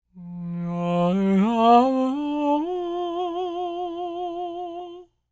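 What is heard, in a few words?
falsetto, Vocal Planet Jazz FX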